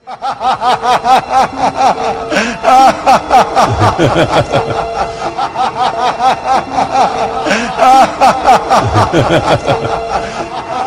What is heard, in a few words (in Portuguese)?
risada opressora